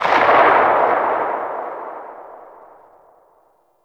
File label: Sniper Rifle Tail; Grineer; Female; Weapons